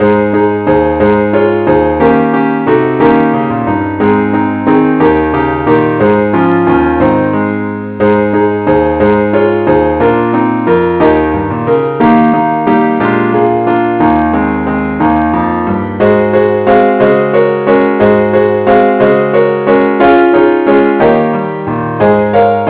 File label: angel voices keyboard only key aes